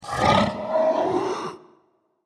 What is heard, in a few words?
hiss; npc; sporecarrier